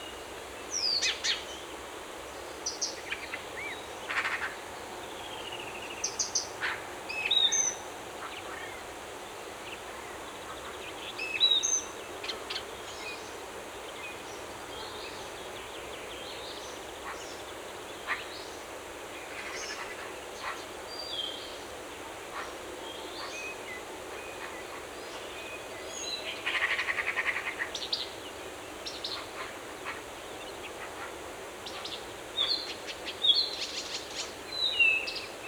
Sounds the Forest Birds; Loop; افکت صدا حیوانات; Animals

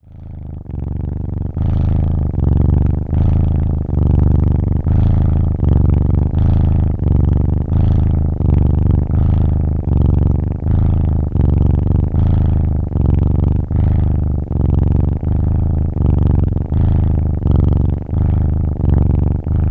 Kitten Purring; افکت صدا حیوانات; Animals

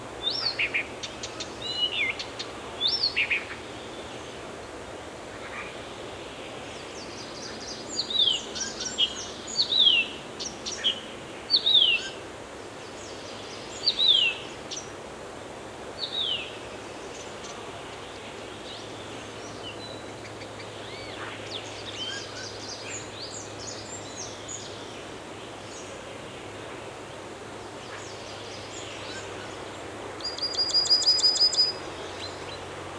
Singing Birds in Forest; Loop; افکت صدا حیوانات; Animals

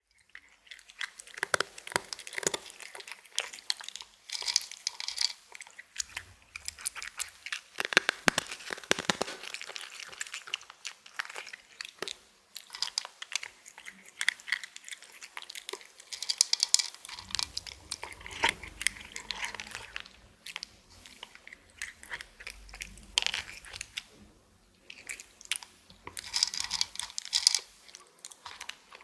Cat Eating Dry Food; افکت صدا حیوانات; Animals

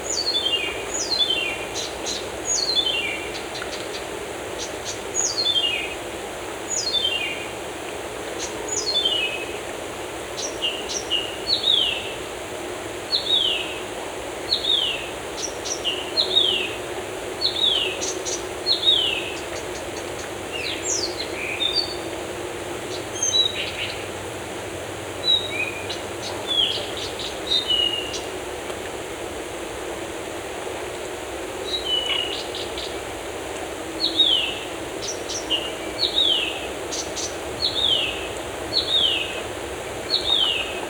Birds in Forest; Loop; افکت صدا حیوانات; Animals

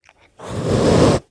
Cat Hisses; افکت صدا حیوانات; Animals

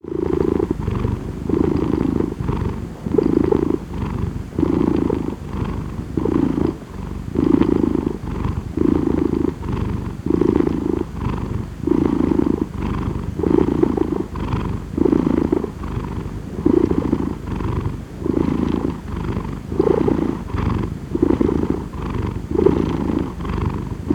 Cat Purring; افکت صدا حیوانات; Animals

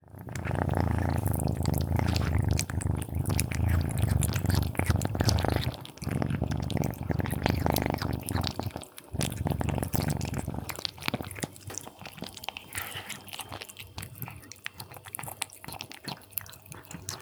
Kitten Eating Wet Food; افکت صدا حیوانات; Animals

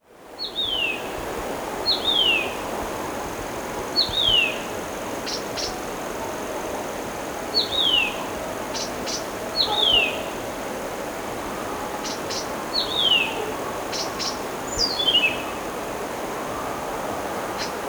Singing Birds Early Morning; افکت صدا حیوانات; Animals

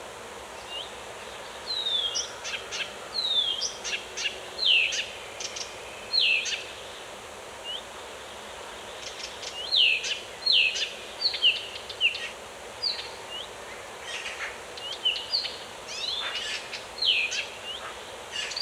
Sounds Birds in Forest; Loop; افکت صدا حیوانات; Animals

